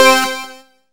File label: achievement; Game Events